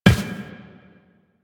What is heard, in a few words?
Step Glass, Footsteps Walk; DE; Warframe; Tenno